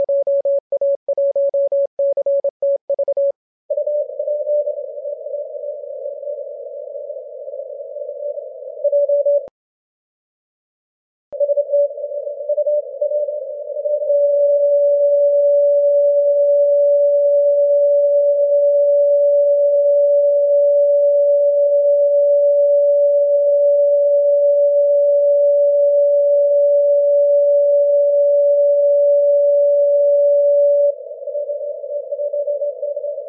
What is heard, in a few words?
21MHz